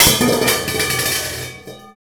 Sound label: FX DECAY, INDUSTRIAL; Roland - LA Composer vol. 1